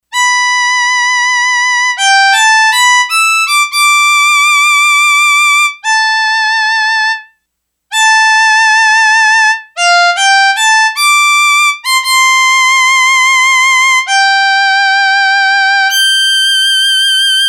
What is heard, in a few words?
Violin 2, Yamaha DX11; Factory Preset Voices